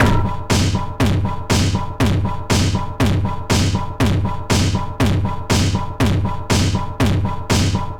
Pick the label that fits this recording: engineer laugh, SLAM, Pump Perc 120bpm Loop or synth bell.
Pump Perc 120bpm Loop